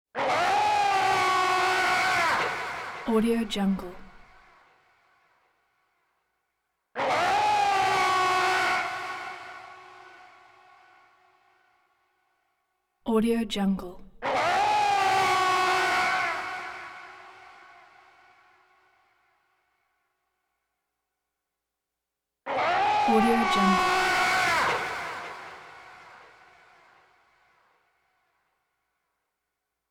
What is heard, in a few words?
nightmare yelling bursts of distorted screaming; prosoundfx